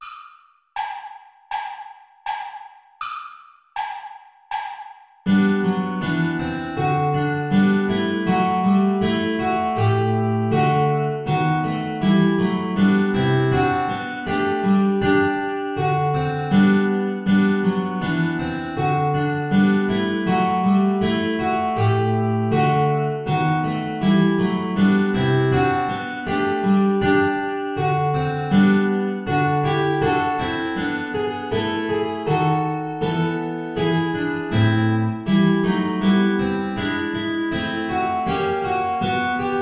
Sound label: play along without guitar